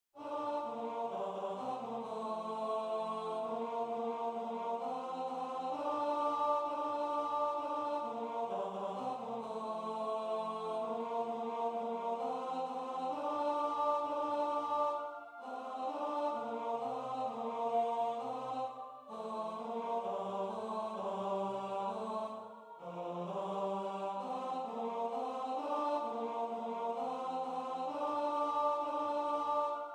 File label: tenors; ding dong